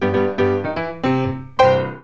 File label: piano, music pianos; KABOOM! - Wild and Wacky Sound Software